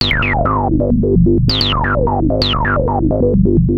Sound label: Analoop, Industrial Analog